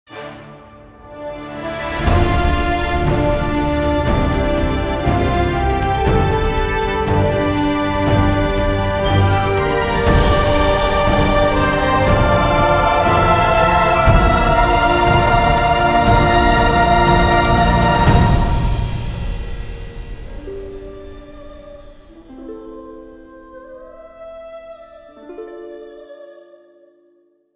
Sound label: Music BGM Cutscene; Wenjia; Cutscene 04